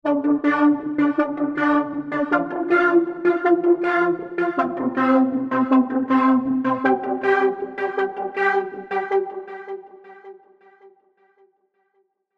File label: serum seqs arp; chiqui audio